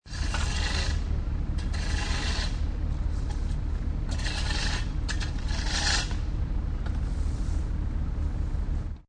Road Crew, Roadcrew with laying asphalt with trucks and rakes; Construction; Sound Effects:Backgrounds